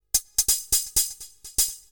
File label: HOUSE 125